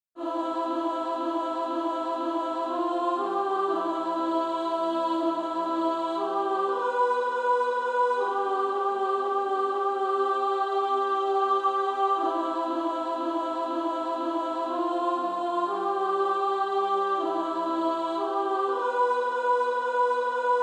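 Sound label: Female Choir, soprano; Redeemed How I Love To Proclaim It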